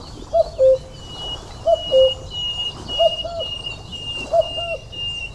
forest, nature; AKAI S-Series CD-ROM Sound Library VOL-6

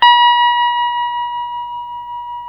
TELE TREM C, Guitars, TREMO; Spectrasonics; Hans Zimmer Guitars VOL-1; TREMO-LUXURY

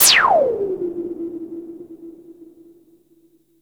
NOISE SHOT; Best Service Alpha Dance vol.2

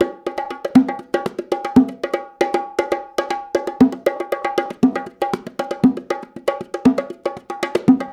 Bongos, 70s Analog Funk; Sonic Foundry (Sony Creative Software); What it Is! 70s Analog Funk

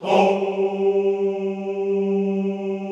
"houuu" G2, classical choir; Best Service; Extended Classical Choir

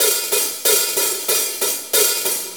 Shuffle HiHats; Sonic Foundry (Sony Creative Software); Drum Tools; Shuffles; HiHat Loops

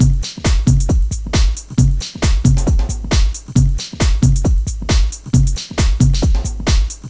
Beat, Drums & Percussion; Electrocution; Beats

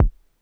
polyvox bassdrum; Formanta Radio Plant

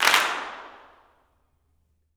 CLAPS, Gospel; ILIO; Vocal Planet

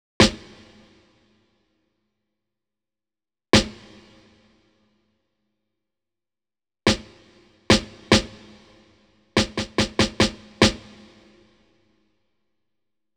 drum track snare, Smooth RnB, 072 G#min

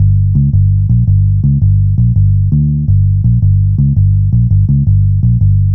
Long Bass, Bass Lines 166.5; Jungle Warfare 1&2

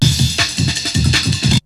BREAKBEATS, TECHNO

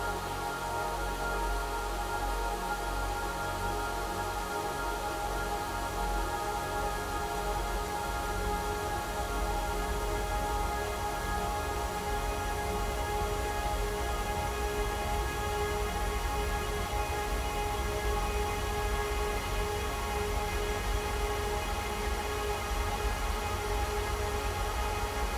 Nurture Atmos; pad

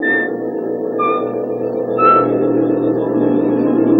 Ancient Music FX, Industrial

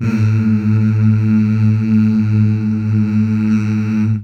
Mmh Male, CH BACK; Best Service Gigapack 1; CH BACK6

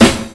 RAP SNARE, Ensoniq ZR-76; 300 Drum Machines

